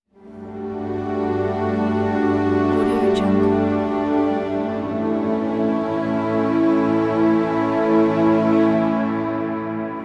snowy strings; coresound

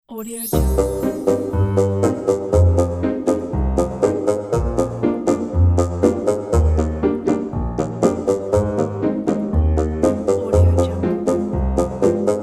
humorous animation

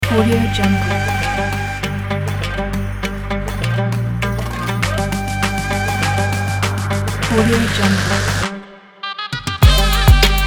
dynamic east trap

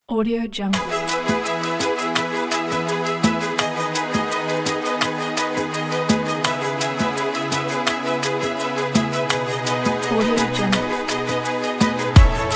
hope uplifting corporate

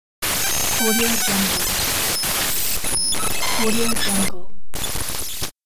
digital glitches; hillmusicmedia

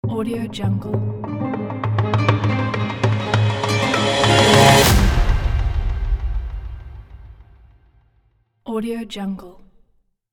egypt logo

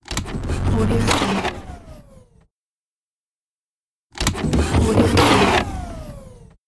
user interface mechanical turning off; inspectorj

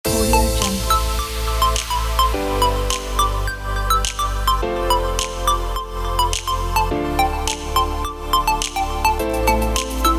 tropical house

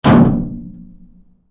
heavy metal impact

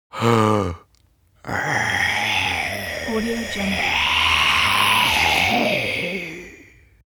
creature zombie male growl inhale exhale throat

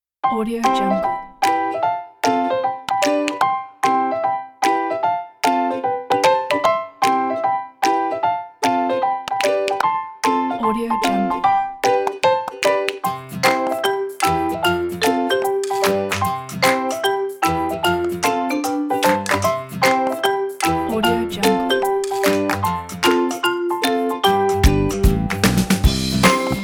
happy kids

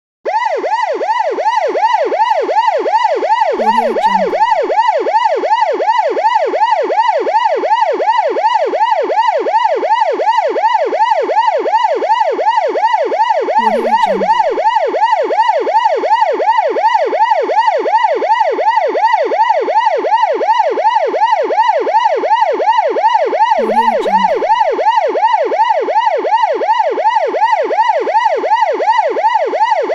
English police siren rings constantly then stops; hollywoodedge

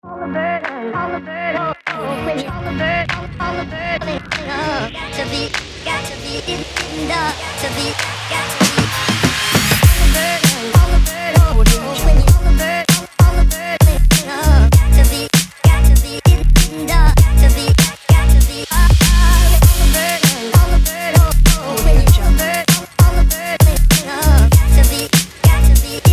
vlog hip hop kit

